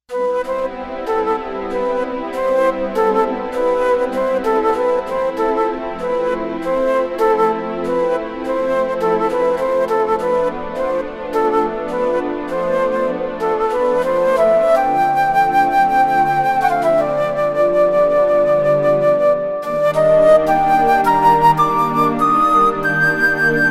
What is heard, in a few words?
Strg Swt Flut, synth